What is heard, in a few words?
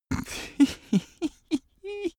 cartoon male giggle snort rising